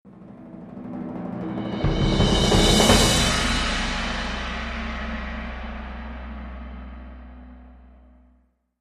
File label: Timpani Builds To Big Crash